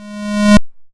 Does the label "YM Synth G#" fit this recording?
Yes